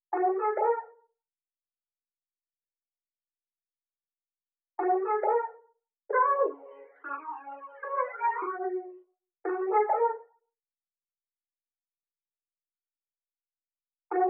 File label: 103 BPM F# Maj Vocal, Afrobeats; Melody; Loop Stems; Cymatics; Bahamas